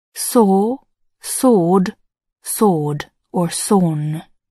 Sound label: saw